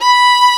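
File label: Vio legato B4; Digital Sound Factory Virtuoso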